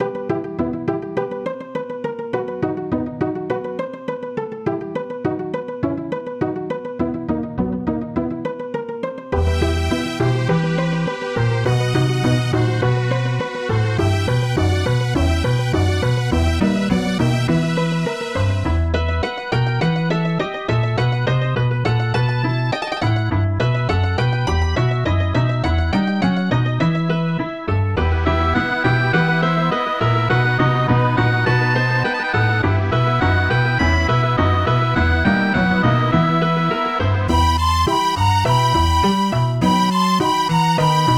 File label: Dungeon; Voidspire Tactics